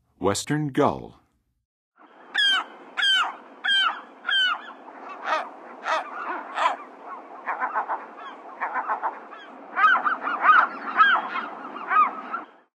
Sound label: Western Gull, Songs of Birds of North America; Western USA; Western USA Songbirds